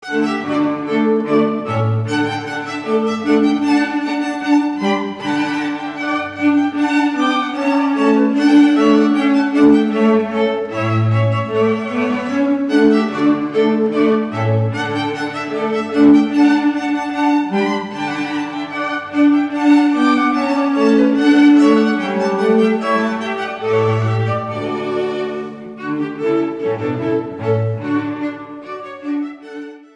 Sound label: strings quartet; Rondeau